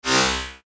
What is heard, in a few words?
beaming